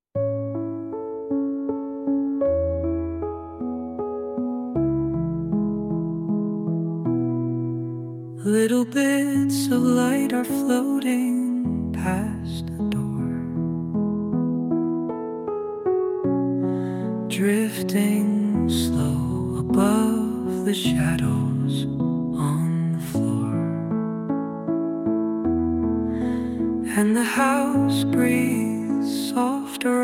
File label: sian lullaby